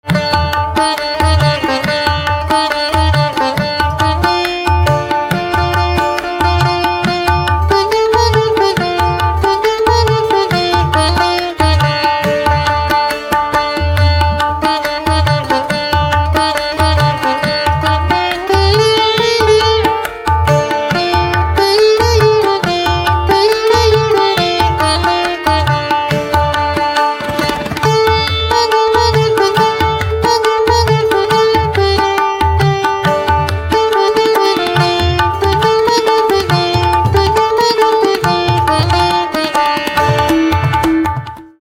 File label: Sitar with Tabla Instrumental Ringtone